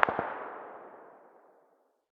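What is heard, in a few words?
light machine gun very far distance; tacz-tweak-pack; taczmechanics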